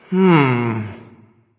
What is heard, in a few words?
thinking; smite; nezha